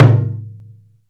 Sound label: matsuridaiko, Ethnic Percussion